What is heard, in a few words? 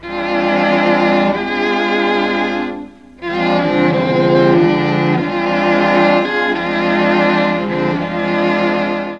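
bass strings, Mellotron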